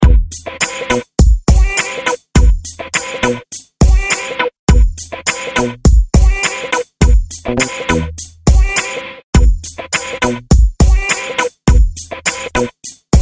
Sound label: swaggalicious 107bpm